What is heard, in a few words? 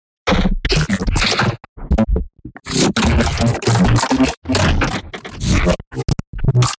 glitch loop